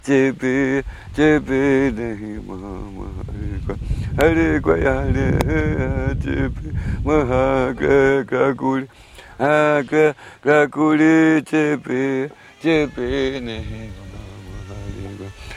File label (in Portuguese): timbiras